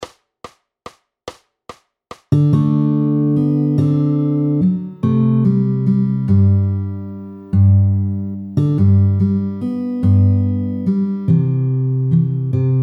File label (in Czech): GTR, IrskaHudbaKytara; The Croppy Boy